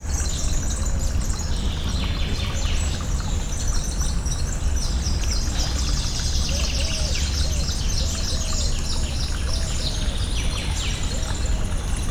tóparti hangulat; szegedi vadaspark; szegedi zoo 2011

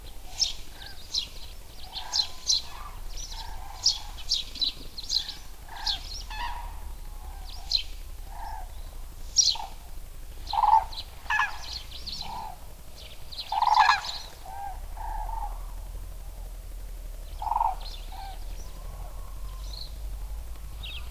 verebes darvak, házi veréb; Görestanya

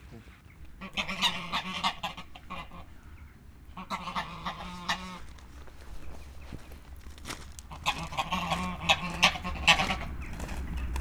nyári ludak kelepelő gólyák, fehér gólya; Hortobágyi Nemzeti Park 2013

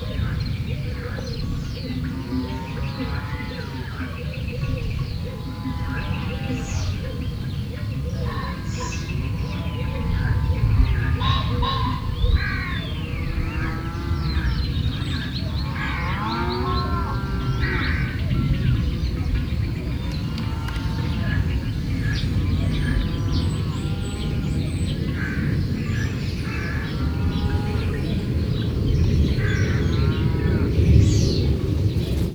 madárlesről szeles idő repülő, puszta; Hortobágyi Nemzeti Park

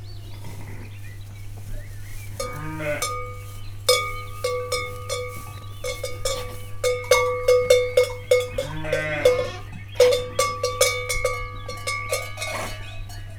hodálynál, juh; Egerszög

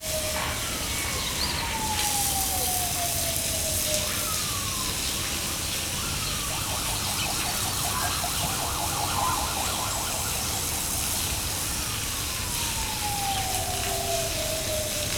slagozzák az orvosmedve kifutót, szamár, páva, kanadai lúd; debreceni zoo 2012

